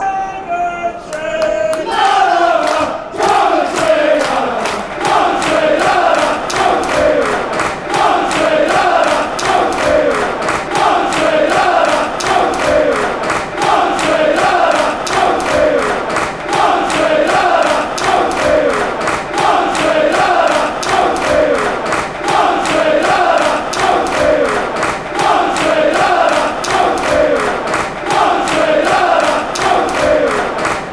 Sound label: nanana, chants; coventry